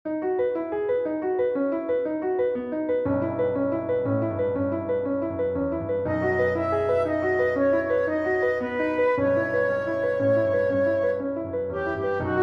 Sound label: for flute piano; Chausson Ernest; from sept lodies